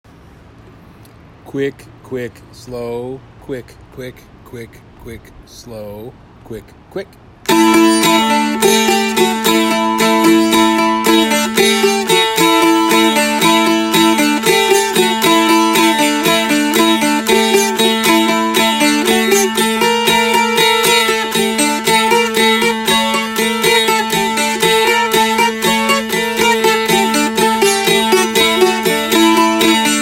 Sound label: Ovčepolsko Oro tambura